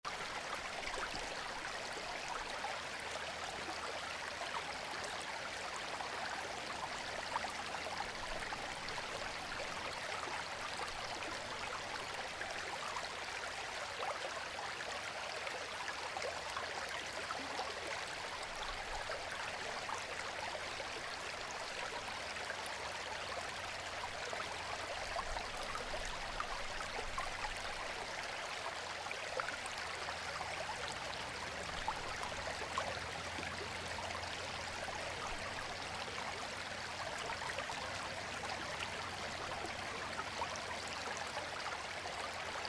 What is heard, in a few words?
stream; 流水; 潮汐白噪音